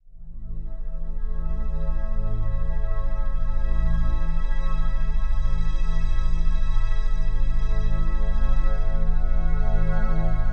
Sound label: Brain Waves Therapy Solfeggio Frequencies Tones Isochronic Tones